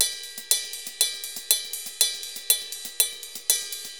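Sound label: RIDE L, salsa drums and percussion; Best Service ProSamples vol.14; AKAI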